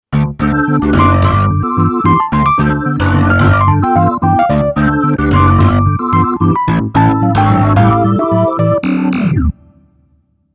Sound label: Clavia Nord Electro Rack